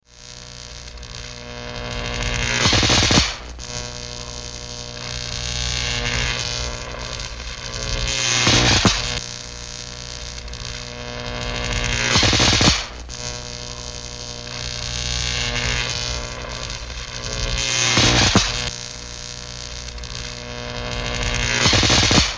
Jacobs Ladder Cycle

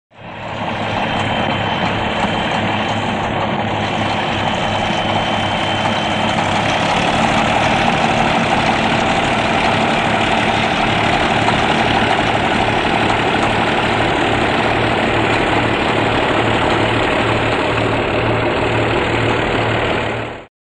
Bulldozer Idles

